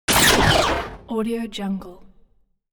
quick heavy weapon laser blast

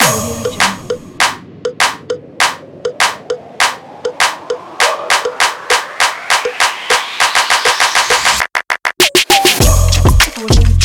rap in

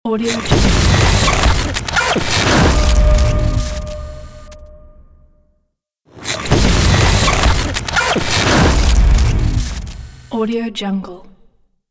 transforming hitech logo ident

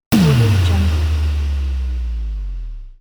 boom

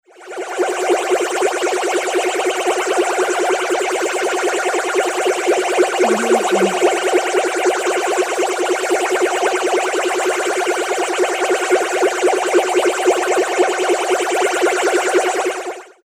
flying saucer

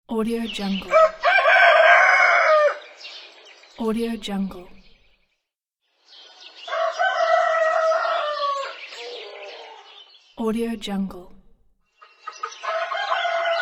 cock crowing

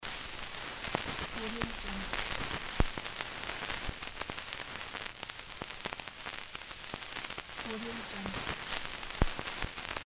record vinyl crackling

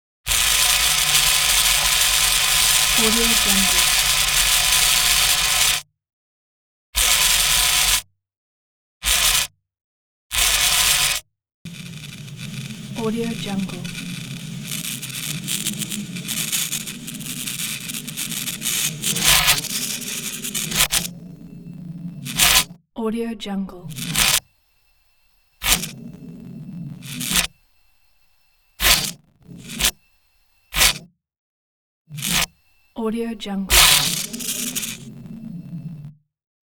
utilities electric layer

